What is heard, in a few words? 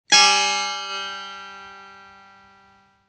setar la b